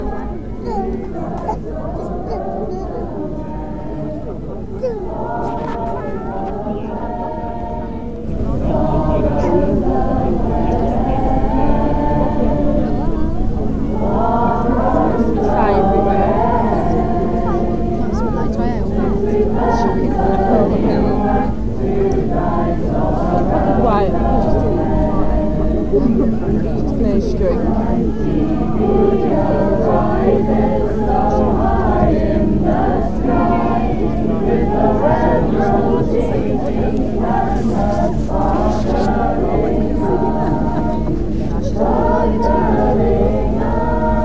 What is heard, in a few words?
harmony singing ambiance and dance at bromyard folk festival